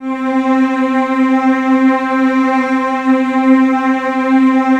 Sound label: C3, Strings; ILIO; Kirk Hunter Virtuoso Strings VOL-3; STR TAME